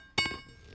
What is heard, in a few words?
Metal Drop Bar Resonance